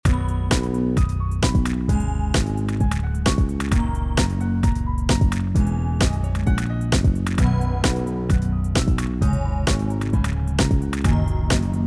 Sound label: mix front to back piano levels; 钢琴音量降低; Waves